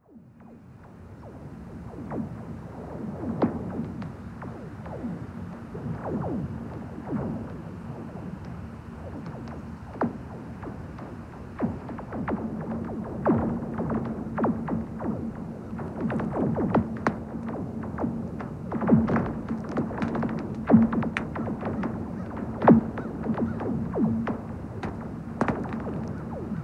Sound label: lake ice boom, the sound of snow and ice; curt olson